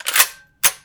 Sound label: Gun Draw Select Weapon, Weapons Foley; SFX Kit